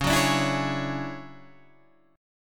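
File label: D Major Sharp 11th D flat, chord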